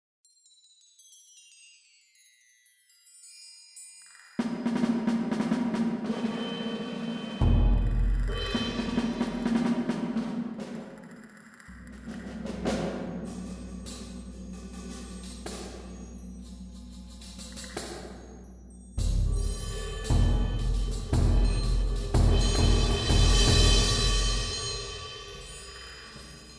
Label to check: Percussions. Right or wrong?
Right